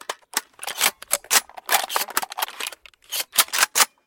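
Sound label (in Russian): неторопливая сборка разборка автомата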